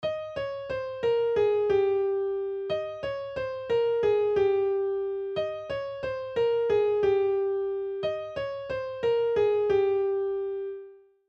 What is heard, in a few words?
KEY of Ab